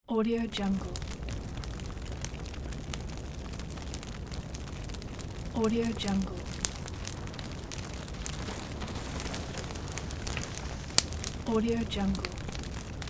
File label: fire